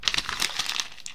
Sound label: paper crushed